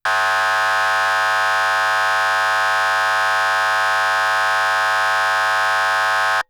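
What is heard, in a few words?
Federal Signal 80k Manual, siren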